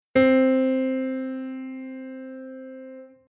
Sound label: soprano low scale